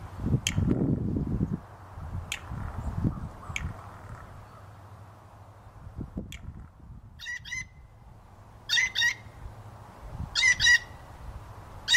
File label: Blue Jay Sounds